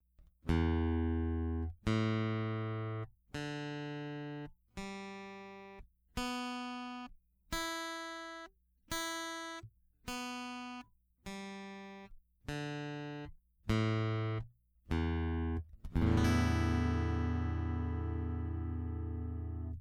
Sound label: Bone DI singlenote, acoustic guitar